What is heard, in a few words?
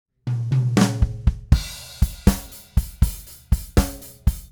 Rocky Mountain Groove, Drums